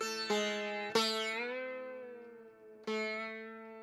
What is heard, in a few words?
SITAR ETHNO 125 A, Guitar; eJay